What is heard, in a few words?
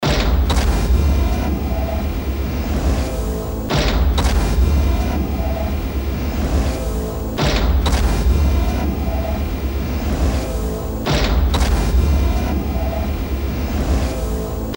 musical fx loop